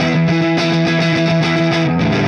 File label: Clinical Distorted Funk Guitar; Sonic Foundry (Sony Creative Software); Indie Rock Anthems; Session 02 - Clinical